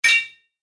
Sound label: Message Alert, beep